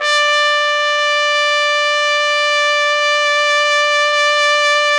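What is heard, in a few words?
TPT, trumpet; Roland - LA Composer vol. 3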